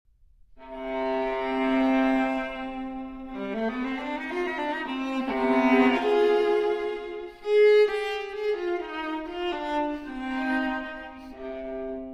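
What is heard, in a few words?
Cello Suite No. 5 in C Minor Transcription For Viola Prélude; J.S. Bach; BWV 1011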